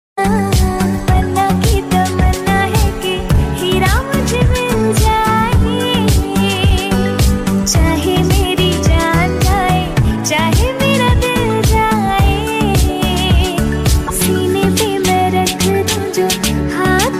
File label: Love Song Ringtone